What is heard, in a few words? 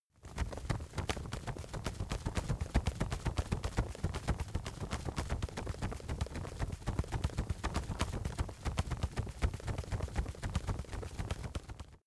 wings flap fast